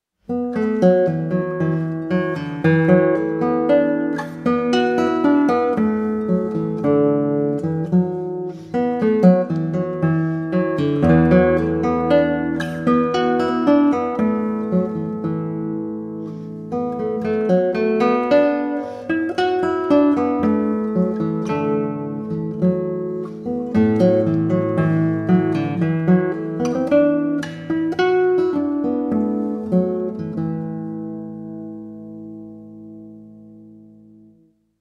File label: Pentatonic Piece